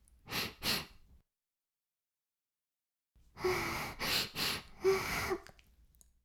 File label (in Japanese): フェラ音声, ASMR; 白くて黒い魔性円環